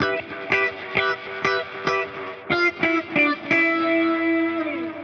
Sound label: Tele Dub, 95bpm Guitar Dub; musicradar; dub designer